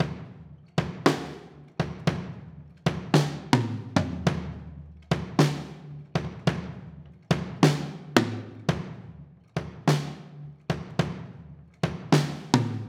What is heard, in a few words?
Drum Set